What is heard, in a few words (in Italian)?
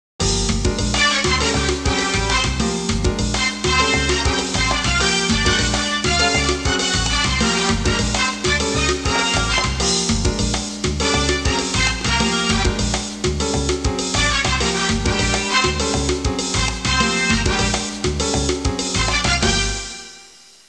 musica latina